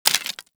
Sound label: Bullet Impact Debris Wood; Warframe; Weapons; Bullet Impacts